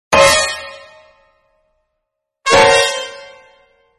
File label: Fanfares Witch spells